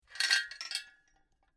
Metal Chain Movements; Chain sounds

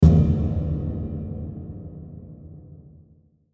Hit Drum Low; Hit Drum Low Filter Reverb; Musical; Blastwave

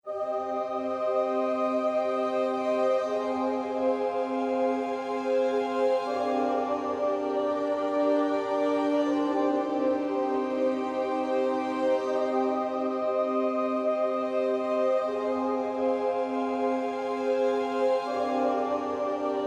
Transition Sad Music Ending

